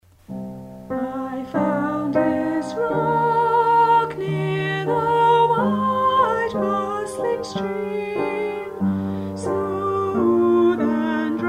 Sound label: Voices melody